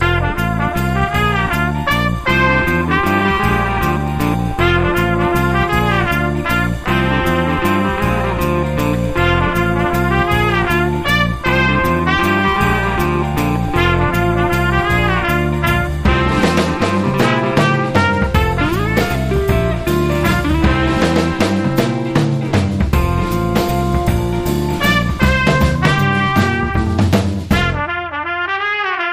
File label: Funny Waltz